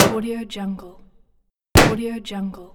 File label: balloon pop